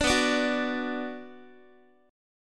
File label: B-Minor, Guitar; strummed; JGuitar